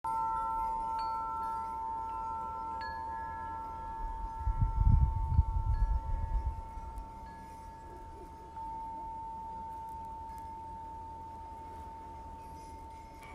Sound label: wind chimes